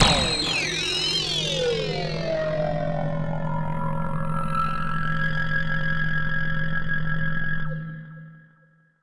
Vortex; Dance; SFX

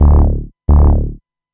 bass, dance

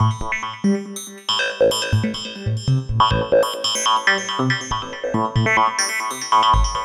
Dove Kiss, Synths Lead

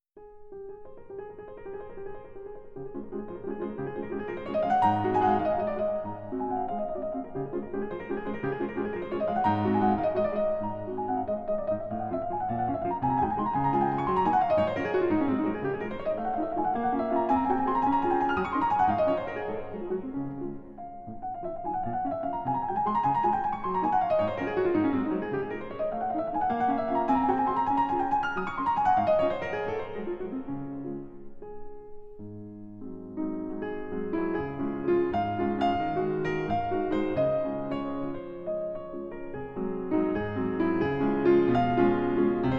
''MINUTE'' WALTZ IN D-FLAT MAJOR; Chopin

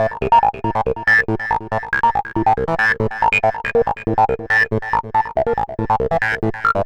Synth, Synths Lead; Dance

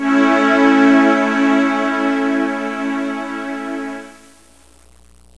QUARTET A; MUSIC

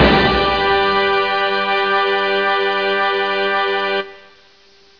FANFARE, ORCHHITS

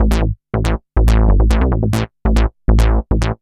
bassline, dance

